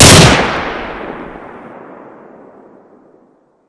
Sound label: Fire, gun